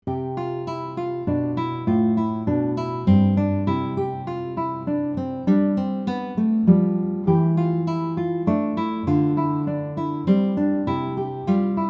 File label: Minuet, guitar